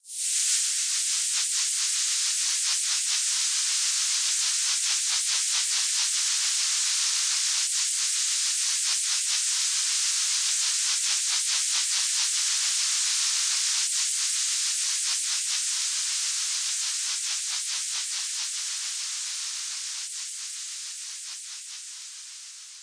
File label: Slip